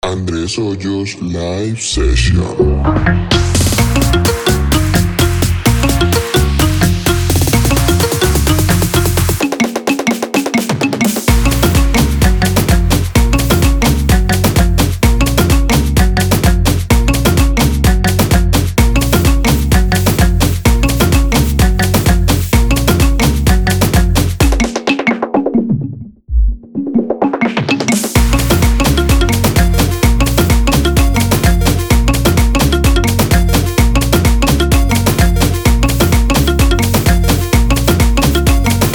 Marimbas

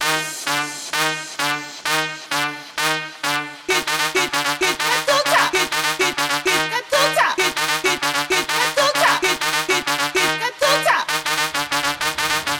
130 BPM

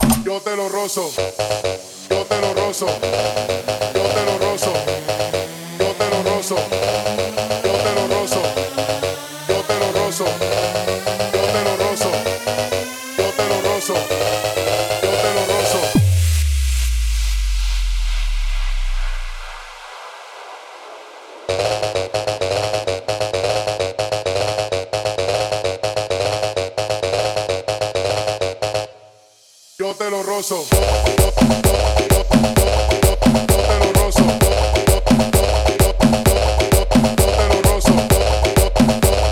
130 BPM

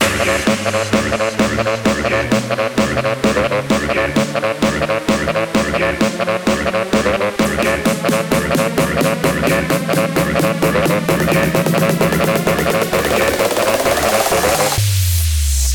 130 BPM

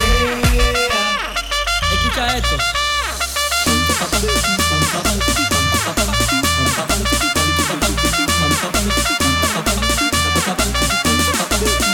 130 BPM

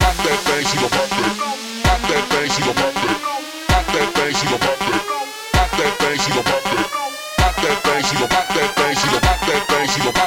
130 BPM

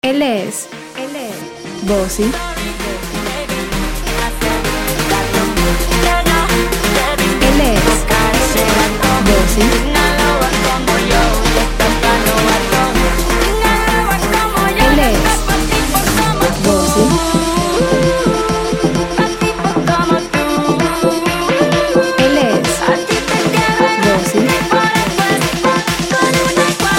Rumba 53 Mashup

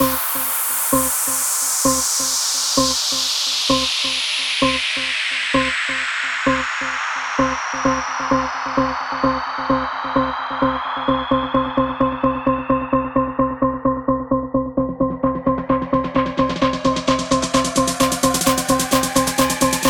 Original Mix 130 Bpm

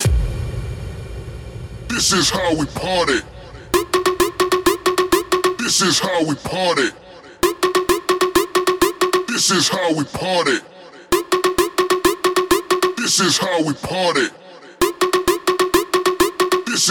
PARTY 130 BPM

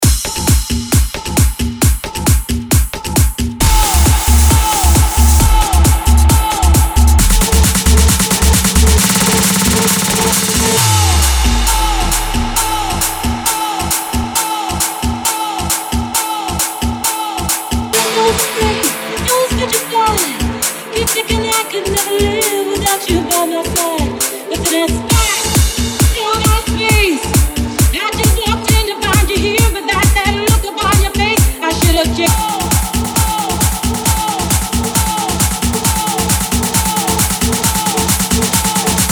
Bootleg Remix 134 BPM

